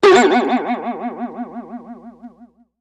Boing Box Vibrato

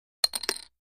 Poker Chip Drop, casino games chip mvmt

